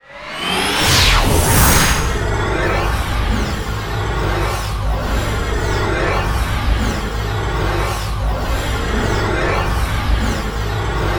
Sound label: Duel Entry Loop